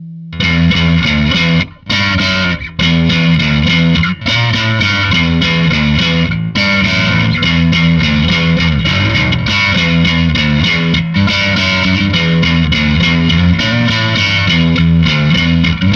humbug, bass

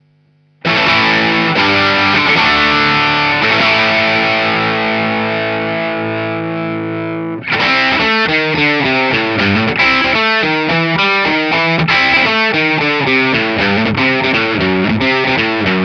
pedal 52, tele

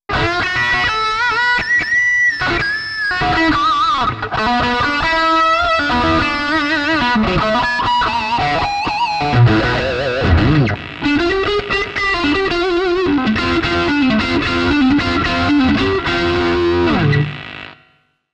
amplitube lead

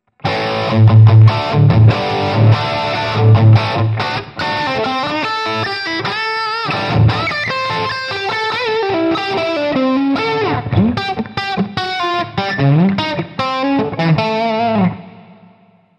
plexi; PODxtLive

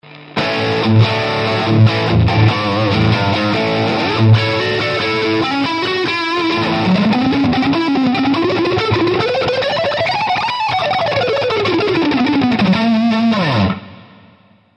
plexi S Tube driver; PODxtLive

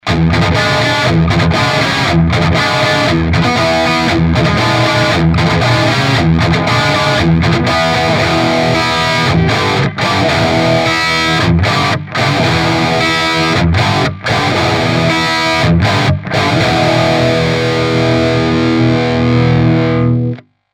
impulz

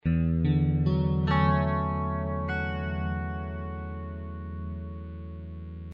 Match chief + rotary drum

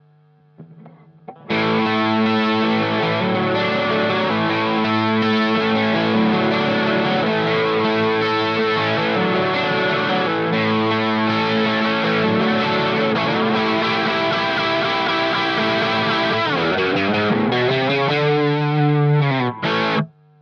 mv3 standard, tele

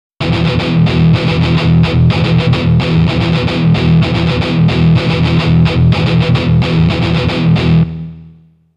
JCM900, virtualne aparaty

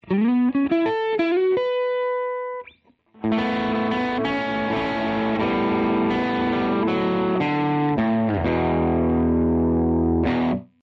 Vox 30

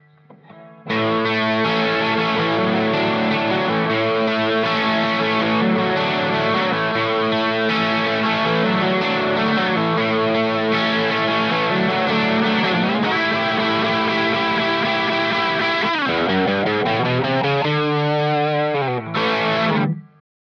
mv3 custom, tele

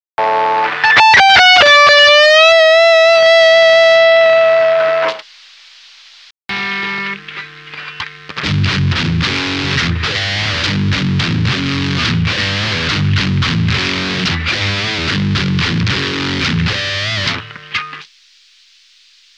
speaker sim

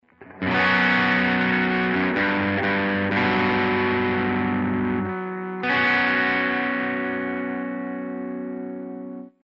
Double verb + screamer

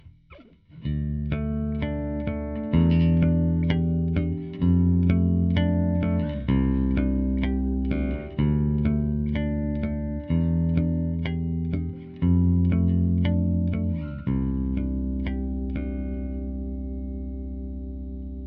fender, bass

